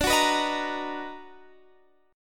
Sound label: B Major 7th D; Bmaj7/D strummed; JGuitar